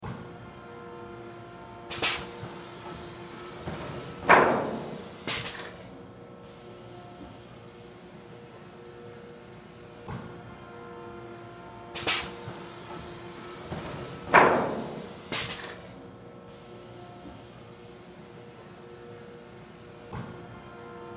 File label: Pressing Machine, Pressing Machine Hydraulic Metal Impacts Loop; Factory; Factories; Industry